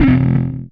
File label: BAS TON; E-Lab; X Static Goldmine 2; akai